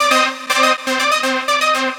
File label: Trance Synth